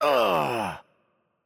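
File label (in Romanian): Hurt Heavy, Romanian; bloom minigame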